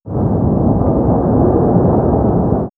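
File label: Damp Hum, electronic fx; X-Static Goldmine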